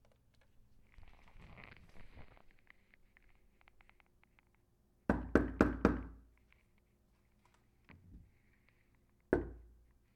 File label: Door knock